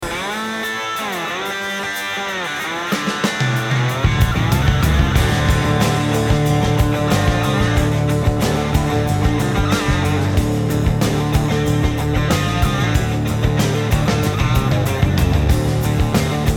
120Hz HPF Out

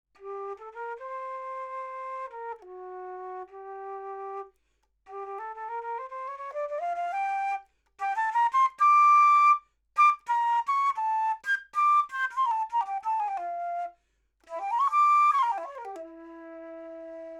Flute, 30cm RC

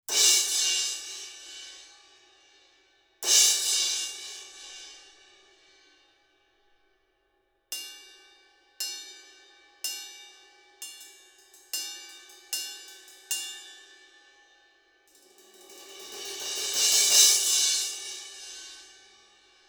Cymb Crash; 90 Degrees From Vertical

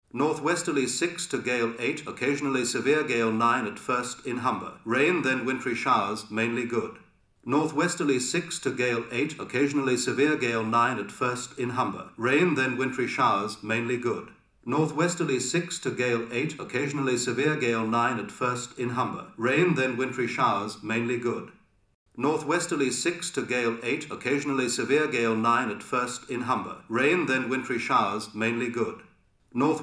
Imaging XY 90 Hypercardioid

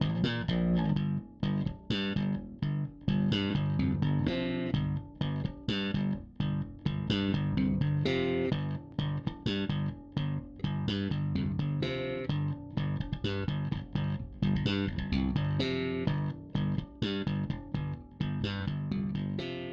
Bass DI

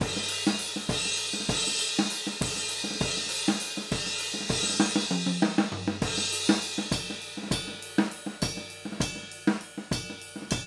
Spots Tonal Overheads

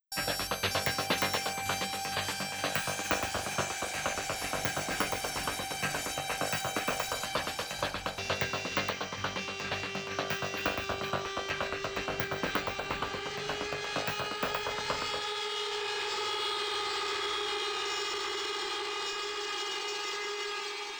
Mix SFX Solo

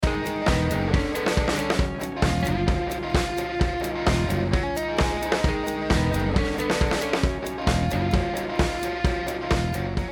Separation Full Mix; Recording Mistakes

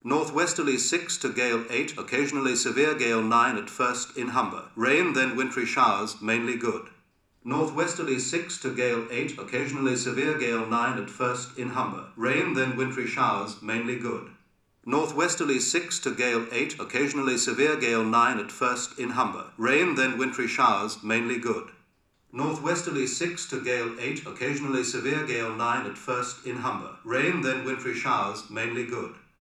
Centre Vs Edge XY 90 Cardioid

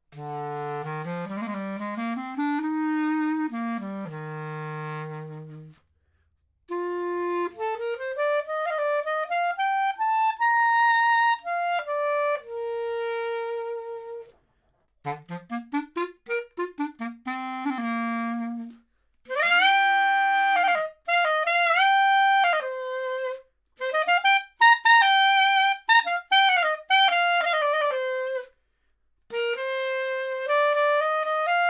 Clar Bb

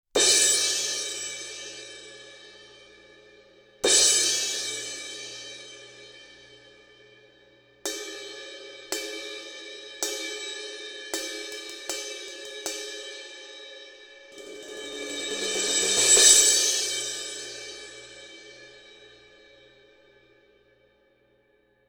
Cymb Crash; Close Mid

